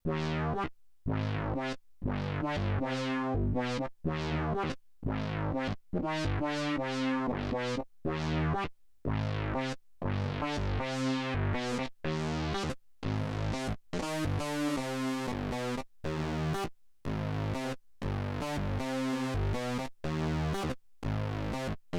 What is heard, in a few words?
PWM Bass